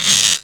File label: bow